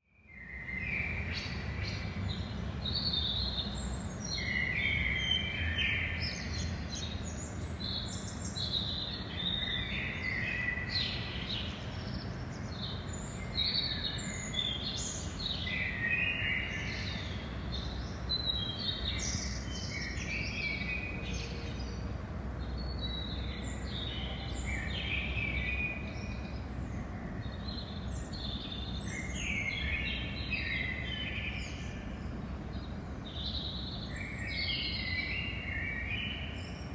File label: birds